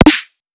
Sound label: click snare